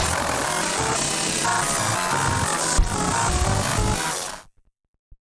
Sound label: Malfunction Synth, malfunctioning synth samples